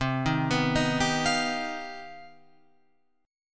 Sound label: C Minor Major 11th, Guitar, Open F; arpeggiated; JGuitar